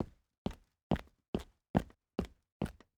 Footsteps Cement; Haunted Bloodlines; SFX Producer

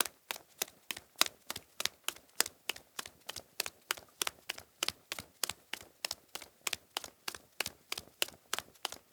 Footsteps Cement; HauntedBloodlines; SFX Producer

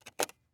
Flashlight Pick Up

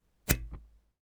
Tape on Glass; Footsteps; Haunted Bloodlines; SFX Producer

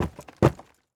Footsteps Wood; HauntedBloodlines; SFX Producer

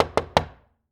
Door Knock Door; MinorHallutinationsSounds; SFX Producer

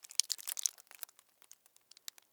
Blood Spill Drip; HauntedBloodlines; SFX Producer

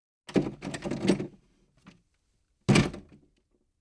Door Recreation Vehicle; MinorHallutinationsSounds; SFX Producer

